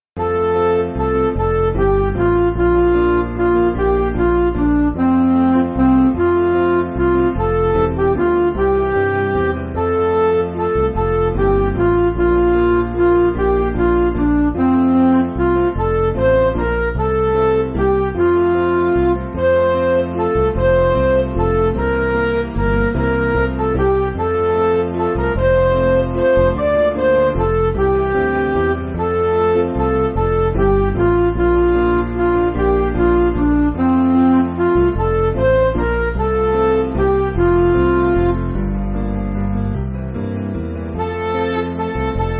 Midi

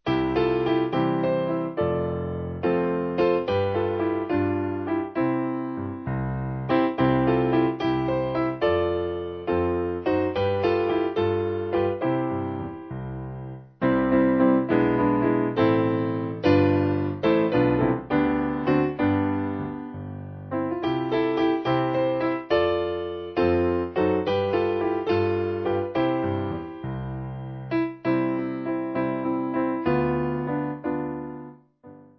Master The Tempest, Piano

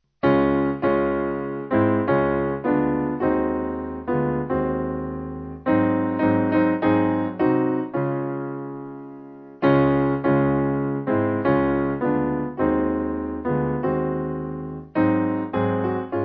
Piano; There Is A Place; McAfee

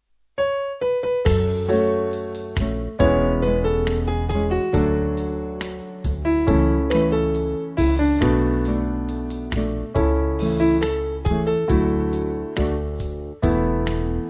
jazz piano

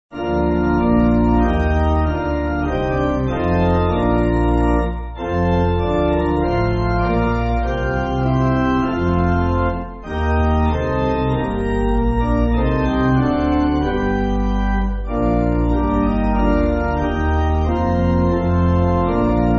Pipe, church music; Come Lord; Veni Domine

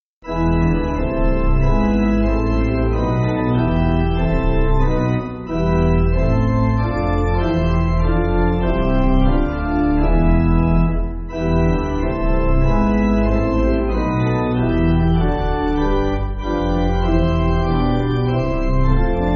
I Am In Deep Sorrow, Pipe; Luther

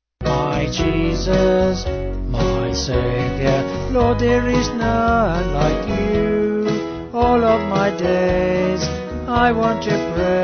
Vocal Band

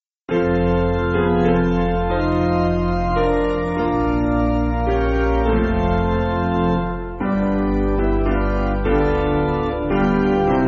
Hymnary Piano; Basic Piano & Organ (CM)

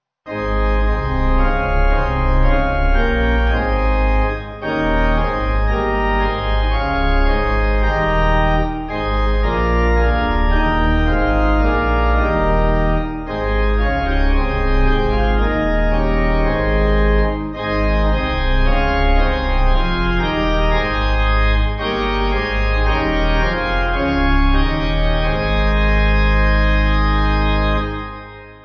Pipe; At Thy Feet; St John

